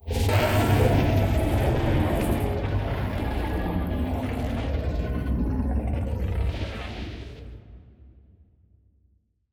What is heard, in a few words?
mutated void worms; Stellaris; grand archive